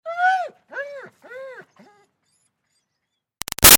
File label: Dog German Shepherd Whine Calling Out; Dogs; Animals